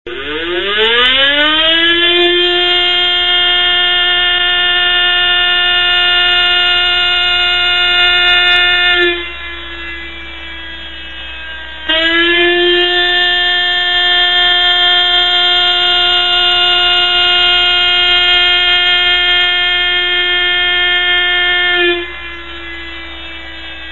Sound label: 345hz; サイレン音; モーターサイレン